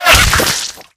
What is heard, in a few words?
bullet impact, infected gore; npc; bullets